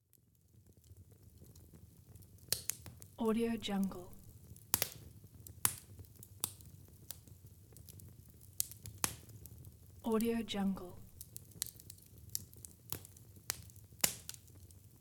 campfire crackling; warpjumper

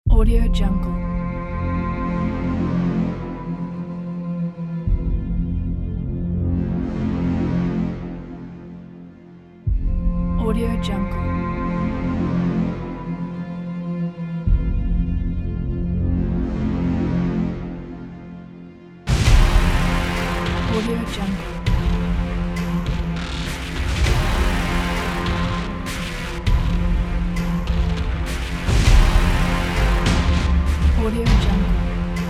one minute cinematic trailer

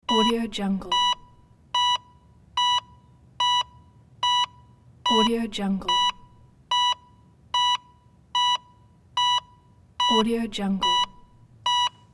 heart monitor steady beeps; hollywood edge

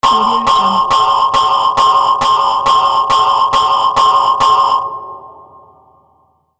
internal submarine alarm

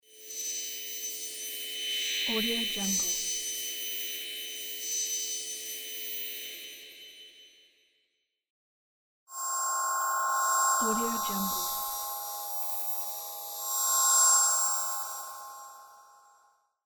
alternating current

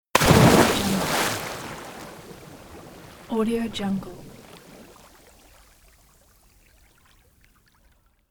jumping and diving into the water; kaelv

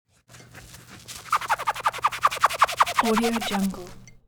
foley wash up sponge squeak fast

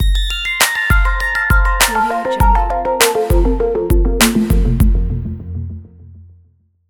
digital crystal logo

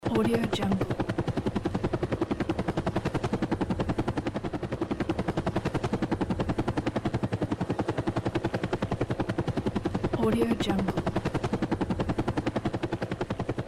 helicopter

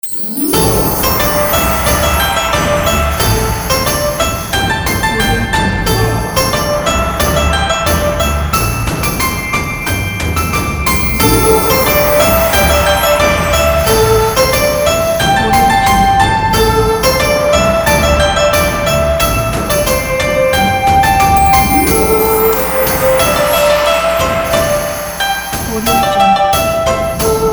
chinese new year

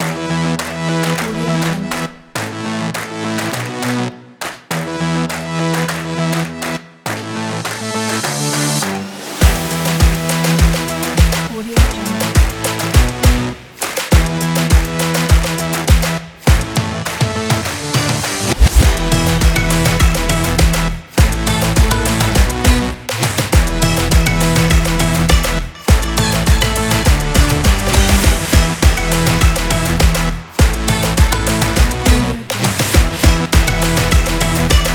fashion beat drum

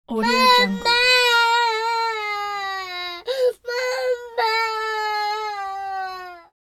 child wails mama; sound-ideas

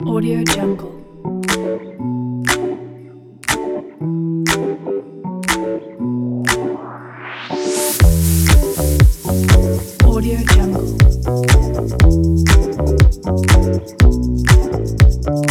fashion funky

